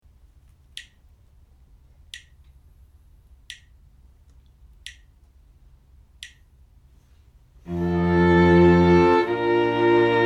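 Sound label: harmonic dictation, string quartet; eartraining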